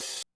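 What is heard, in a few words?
open hi-hat, drumtraks; classic machines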